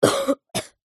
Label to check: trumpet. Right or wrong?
Wrong